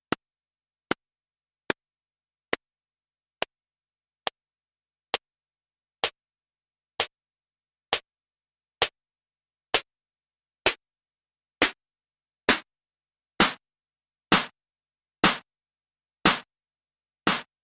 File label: PO 12 Snare